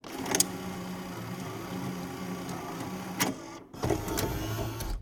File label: betamax tape eject; Sonniss GDC